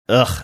ughhh

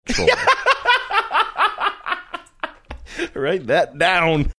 laughing saying write that down

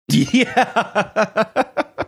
laughing saying yeah

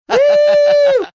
whoo

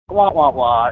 wah wah wah

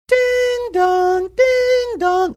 singing ding dong ding dong

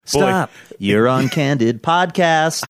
singing stop you're on candid podcast

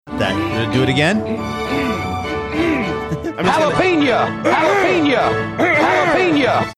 clearing his throat; to jalapeña drop

